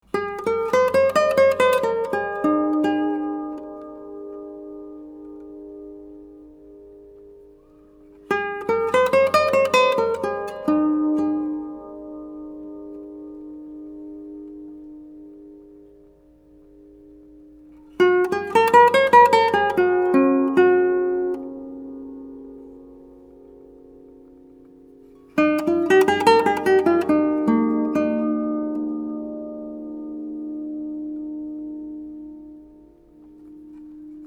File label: Resonance, Kohno15; Resonance Test (Stopped scales / chords showing sympathetic / resonance)